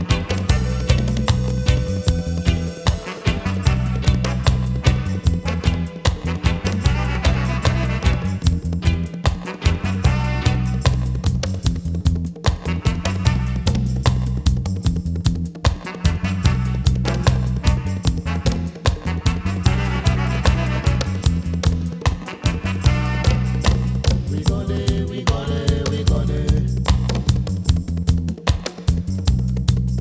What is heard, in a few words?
reggae